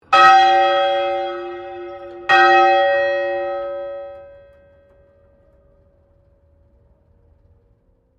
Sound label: Bell St Pauls Cathedral Melbourne Bell 2 Rings; The bells of St Pauls in Melbourne; Musical